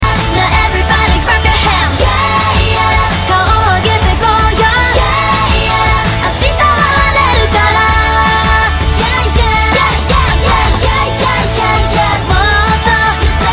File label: clap clap